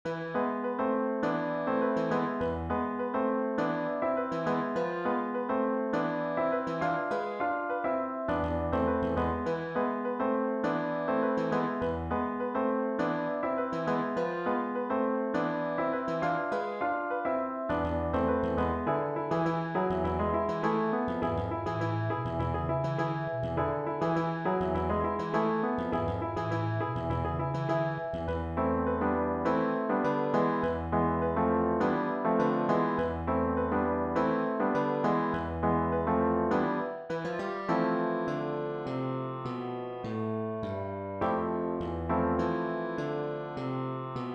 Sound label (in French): melodie